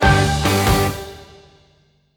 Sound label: Stinger; Crash the Bash - Drink Break